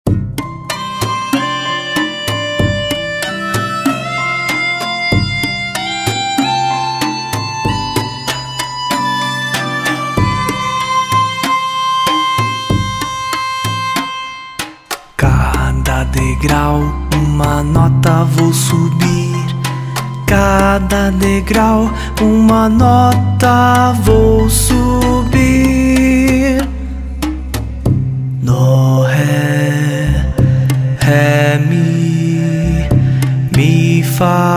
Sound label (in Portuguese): A ESCADA SONORA (O SOM DAS NOTAS MUSICAIS)